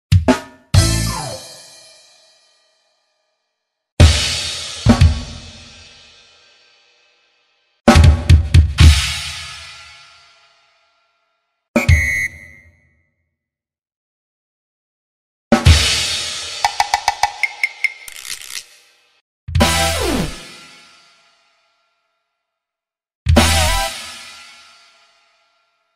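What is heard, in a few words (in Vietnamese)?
tiếng đánh trống hài hước tiếng trống gây cười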